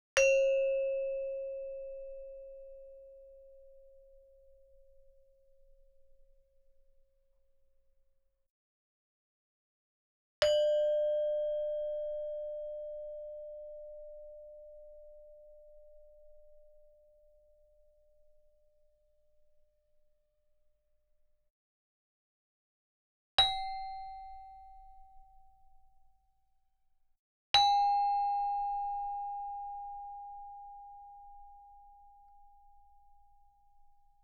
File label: Gamelan; Propeller Island - Complete Gamelan